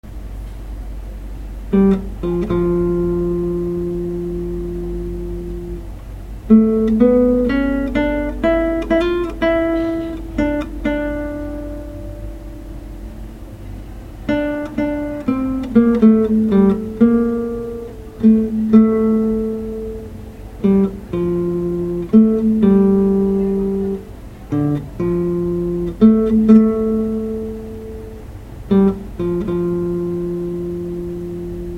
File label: solo